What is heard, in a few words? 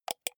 computer mouse wheel click